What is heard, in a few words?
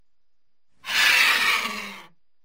pterodactyl scream